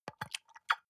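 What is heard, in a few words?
soap dispenser press squish soft, bathroom; haus-garten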